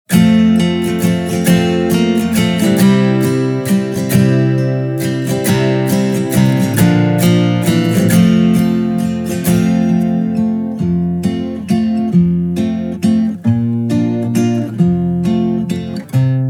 der igel lied karaoke; mini spatzenpost; Schuelerzeitschriften

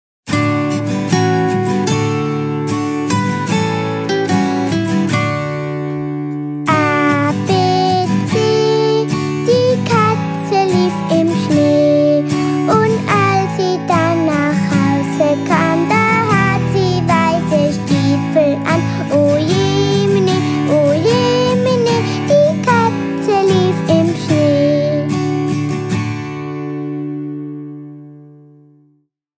lied abc die katze lief im schnee; ich und du; Schuelerzeitschriften

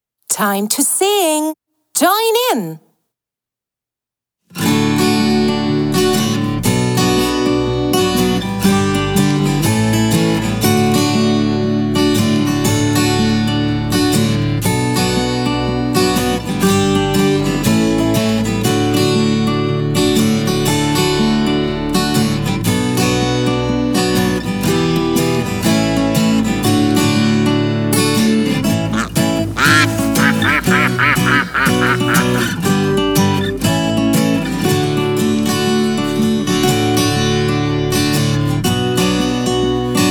englisch song do you know the animal karaoke; mini spatzenpost; Schuelerzeitschriften

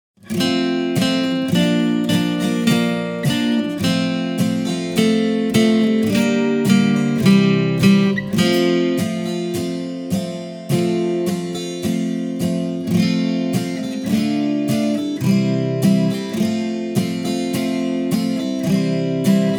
lied wander wander feuersalamander karaoke; ich und du; Schuelerzeitschriften

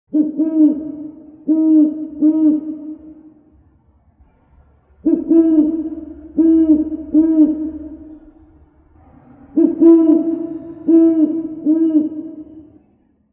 hoeruebung eule; ich und du; Schuelerzeitschriften

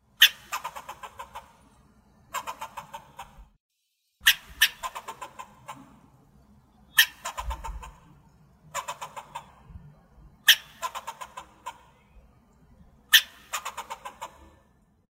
hoeruebung eichhoernchen; ich und du; Schuelerzeitschriften

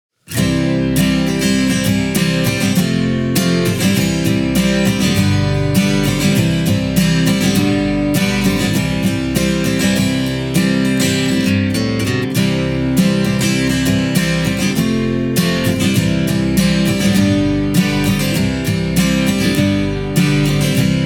lied alle voegel sind schon da karaoke; mini spatzenpost; Schuelerzeitschriften

